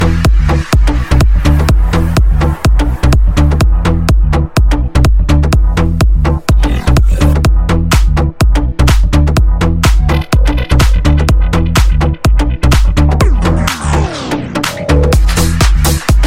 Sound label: hip hop